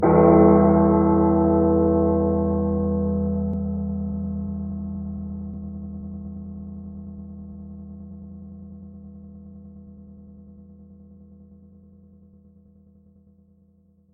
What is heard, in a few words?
Deep bell clang